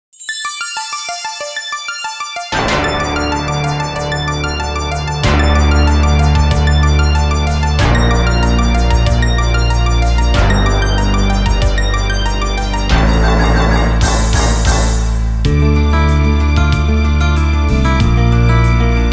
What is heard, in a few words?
Piano